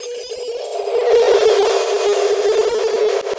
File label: FX Loop, Drums & Drones